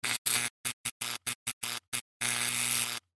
Wild Ball Animated; Bouncy Balls 2

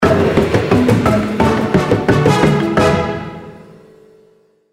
big Win; tiki-beats; eyecon

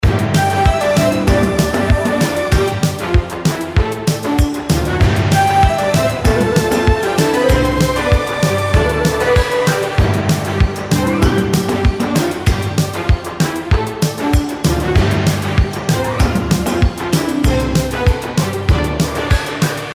Free Spins Music; Chinese; Pig's Feast

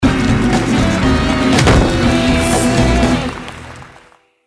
outro; Muerte; Lucha Rumble

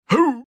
vox short Guy throw; Highland Reels

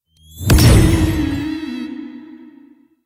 genie appear; Eternal Wishes LuckyTap

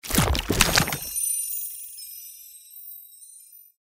games won sfx; Fondant Favourites StarTrail; Eyecon

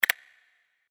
click; Temple of Iris 2

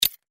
spin click; Football! LuckyTap; Eyecon

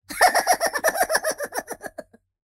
voice laugh; Fruity Burst 2

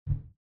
reel stop; Highland Reels

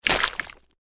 explosion; Fruity Burst 2

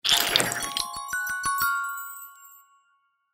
symbol win wild; Fondant Favourites StarTrail; Eyecon

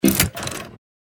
spin; Step Back 7's; reels

